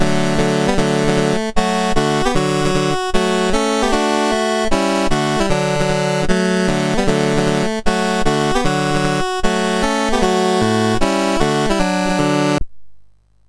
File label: sid MOS6581R3; Games Summer Edition menus